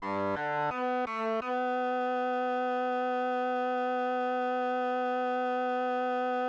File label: bowed